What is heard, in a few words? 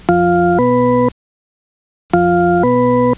tune